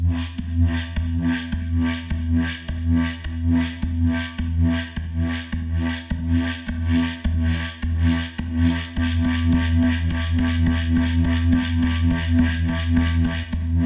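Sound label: rhythm loops, 105 bpm; Rarefaction - Poke in The Ear With A Sharp Stick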